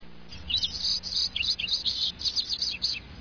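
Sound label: indigo bunting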